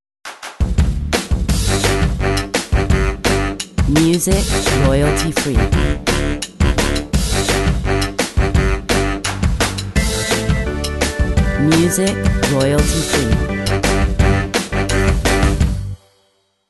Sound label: Pop party